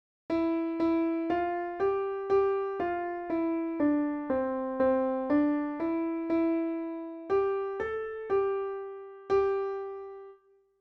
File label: without harmony